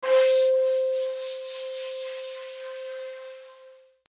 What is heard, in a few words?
start